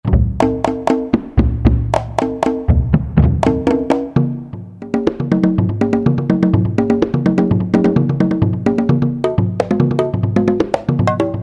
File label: CONGA; Roland SN-U110-02